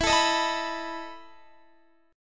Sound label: E Major 7th Suspended 4th E down Guitar; strummed; JGuitar